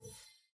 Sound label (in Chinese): 总薪资刷光; nba